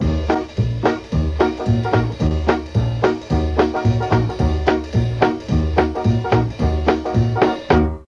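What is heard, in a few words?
B1 trombone, dixie, mellotron; DIXIE+TRMBN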